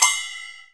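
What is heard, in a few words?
cymbal, Ethnic Perc; Dance Synths; Percussion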